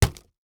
Impact Board Wood Hit By Plastic Tube; Impacts

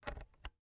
Headphone Plug Inserting Into Jack; AdobeCC

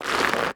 Foley Rock Salt Scraping

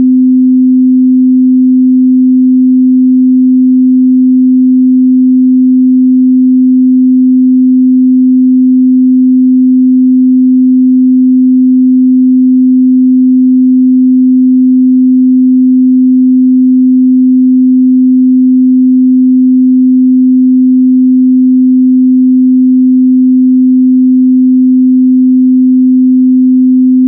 256Hz Generated Sine Wave Tone

